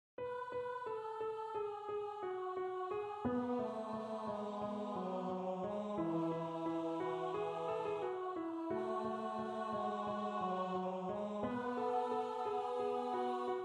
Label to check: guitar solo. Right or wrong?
Wrong